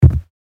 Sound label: Footsteps on carpet boots single step